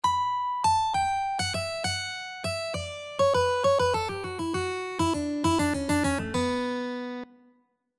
descending mandolin; Contour recognition

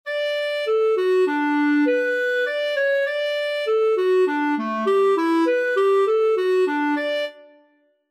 angular wavelike clarinet; Contour recognition

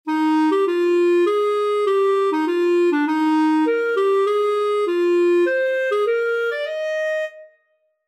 Intervals 3rds clarinet

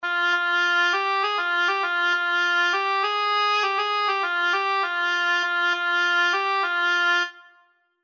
Range identification, min3, oboe

